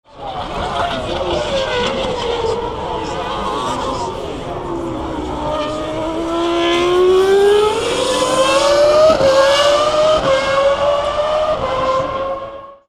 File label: F1 Arrows, engine